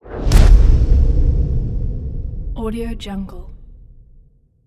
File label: danger impact